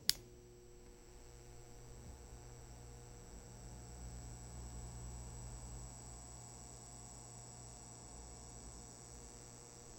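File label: a single compact fluorescent, home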